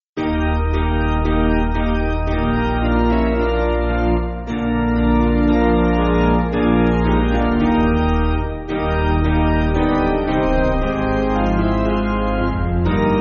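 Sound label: Piano, Free Music Backing Files for Churches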